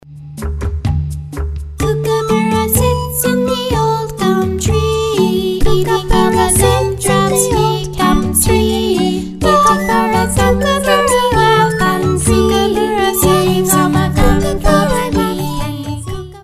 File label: Kookaburra